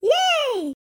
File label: correct; literki dzwieki